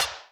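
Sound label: Analog Air Rim, Electric Snare, Yamaha DTXpress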